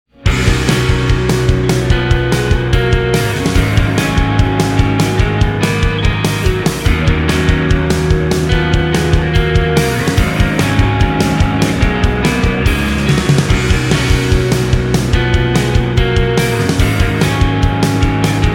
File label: Instrumental